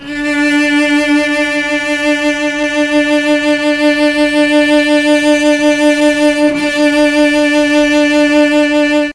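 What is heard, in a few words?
D4 chamber cello, mellotron; CHMBLN CELLO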